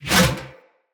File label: Sphere Board Whoosh; Weapons; Swords; Sphere Sword And Board